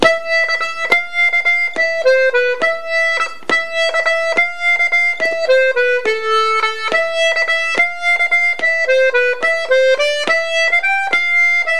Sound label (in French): 2eme voix flutes